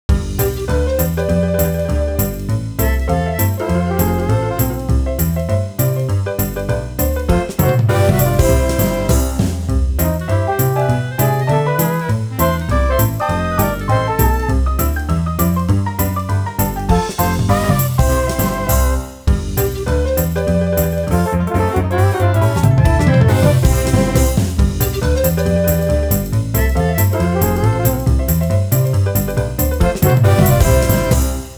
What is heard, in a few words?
music bonus timed mix bpm100 bpm276; Donkey Kong Country Returns HD